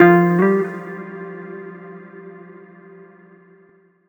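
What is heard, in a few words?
Guitar, Chords Tones; Equipped Music; Loungin House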